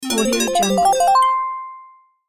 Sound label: earn points